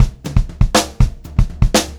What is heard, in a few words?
120 SPEED, Pop-Rock Drumloops